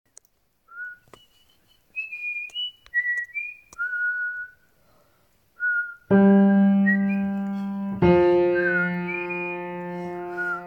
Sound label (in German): Jutz Bass; 1. Bass